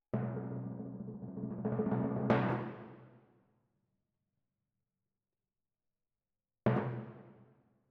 Twin F Timp

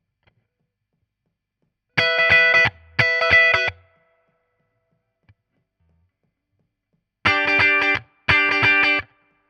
Guit Solo, RNR for piano

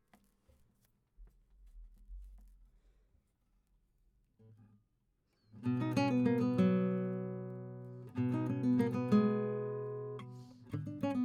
GTR

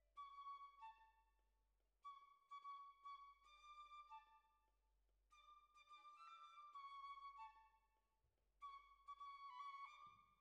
Bassoon, Jazz for piano

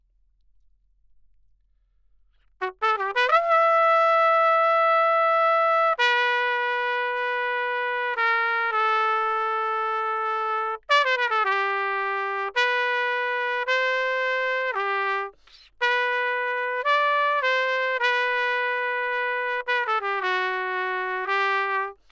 Trumpet

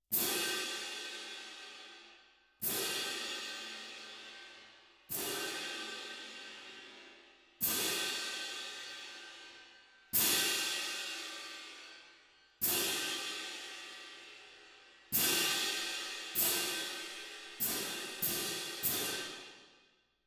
Perc

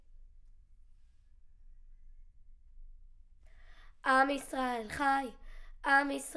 Children Choir; Studio Bekol Children Choir

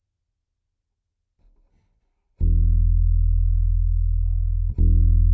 C Bass DI

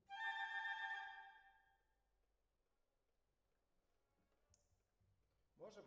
Cellos

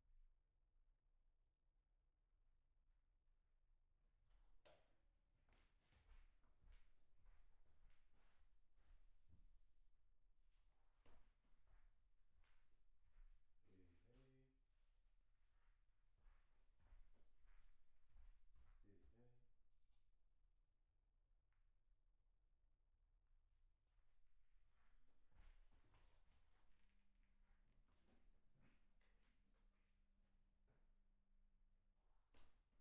PERC OH

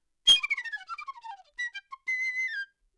Flute

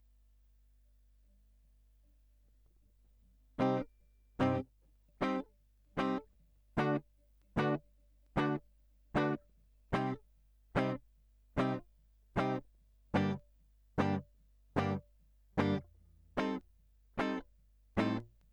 Gtr Line

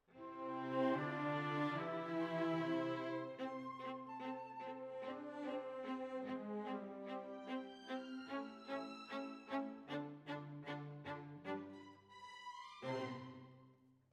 Violas, jazz for piano

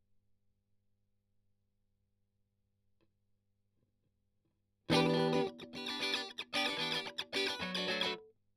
Strum

